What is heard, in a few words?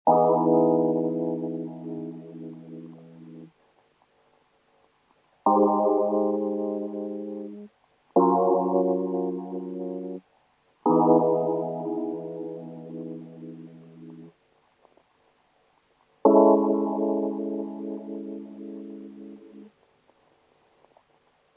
Rhodes Lofi Chords 89bpm Neo Soul Trip Hop Psychedelia Acid Jazz